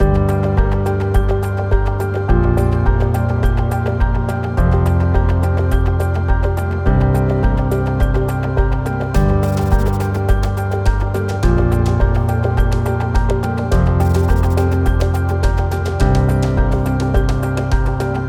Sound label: Futuristic Sci Fi Cinematic Loop